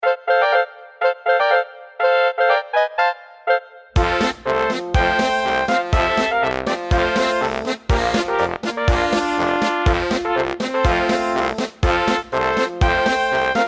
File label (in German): Volksmusik